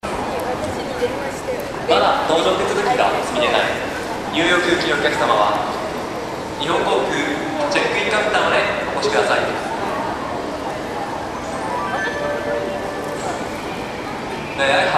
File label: Japanese airport check in